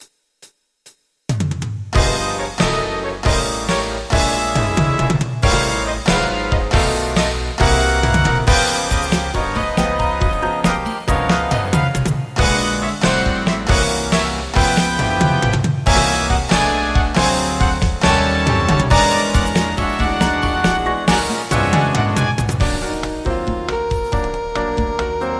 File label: salsa samba mambo